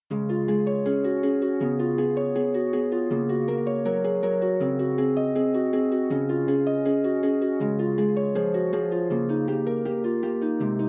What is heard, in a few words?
Prelude, harp; Forty Eight Preludes and Fugues Book 2